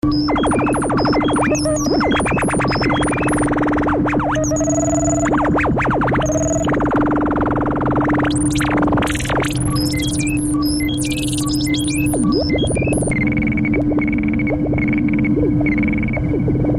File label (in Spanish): laboratorio